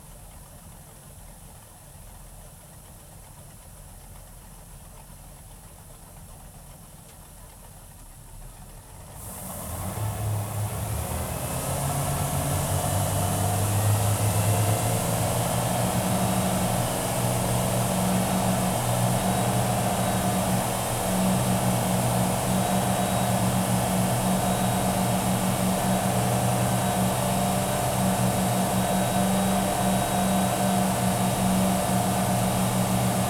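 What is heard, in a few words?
Scarab 34 onboard startup accels shutdown engine back wall; Wellcraft; Pole Position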